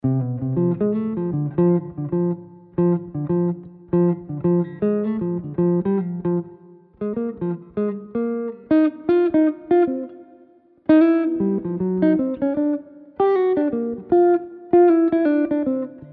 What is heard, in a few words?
Melody, jazz tunes